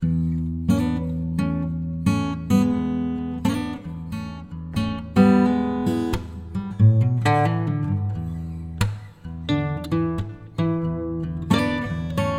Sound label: Blues